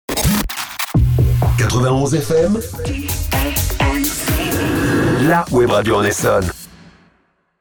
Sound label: Sweep ID; 91 FM; Essonne